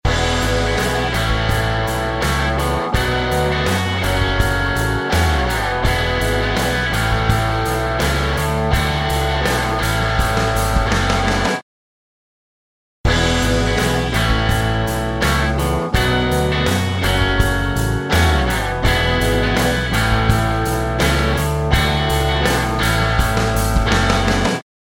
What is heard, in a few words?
guitar; waves